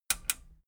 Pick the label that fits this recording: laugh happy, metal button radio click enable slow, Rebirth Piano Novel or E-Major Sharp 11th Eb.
metal button radio click enable slow